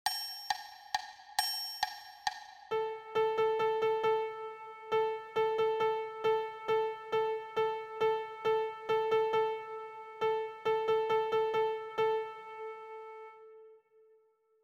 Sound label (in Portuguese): Ditado ritmico 6/8